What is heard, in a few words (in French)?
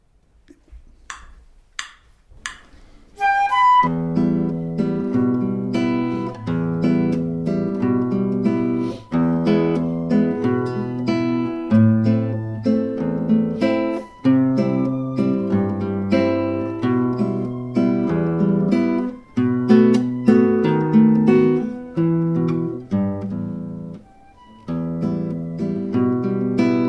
guitare normale; guitare seule; Terezinha de Jesus; version normale, guitare seule